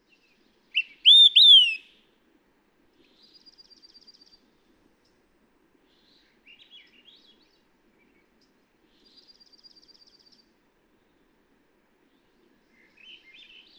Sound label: Olive-sided Flycatcher